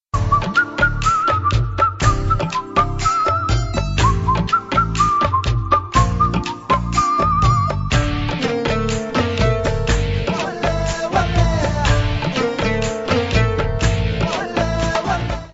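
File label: fanaa whistle instrumental ringtone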